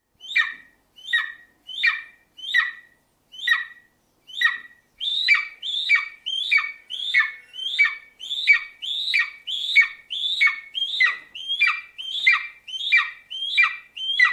Canto da Águia Dourada

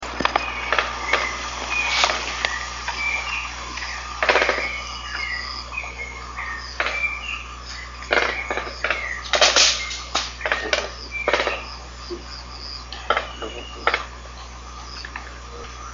canto do jaburu

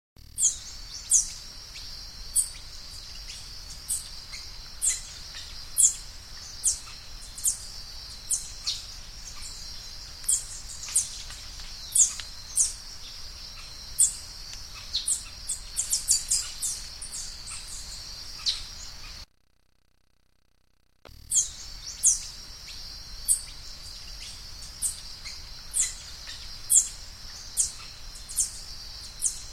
canto do saira sete cores

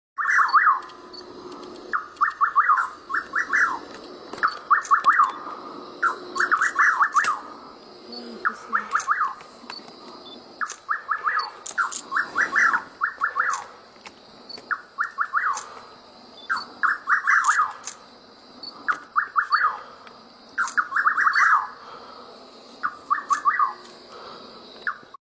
Canto do joao corta pau

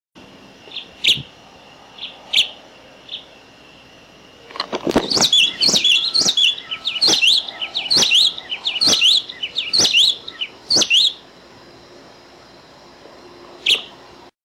Canto da garrincha do oeste

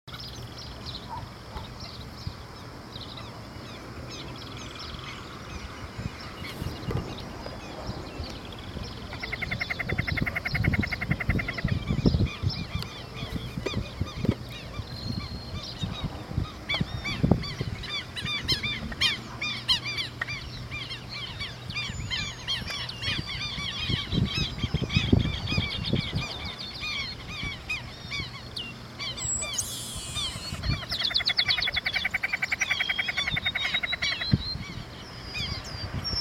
canto do narceja

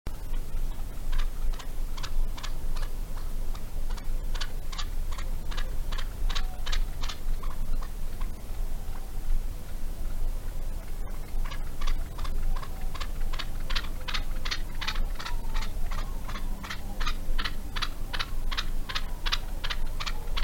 Canto do perdiz moura

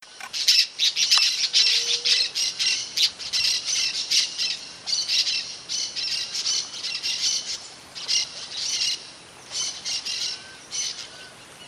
canto do periquito testinha

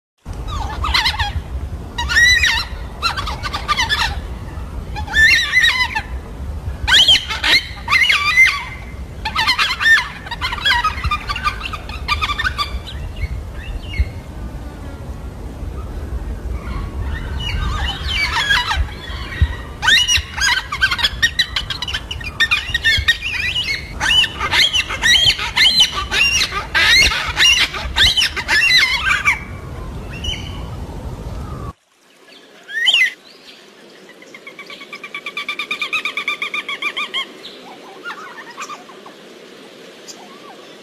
Canto da cacatua funebre

